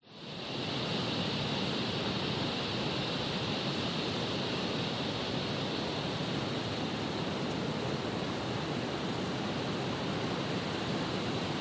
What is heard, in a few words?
nature forest amb summer strong wind single cicada at 26 sec